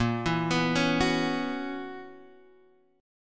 B Major 7th Suspended 2nd Suspended 4th Bb, chord shape